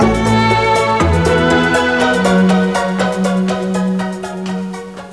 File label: jingle; L'Effet Pommier - Volume 04; Télévision; M6 jingle 3